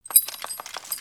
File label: idle rattle